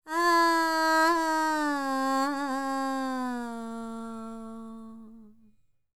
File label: World Voices, INDIA; ILIO; Vocal Planet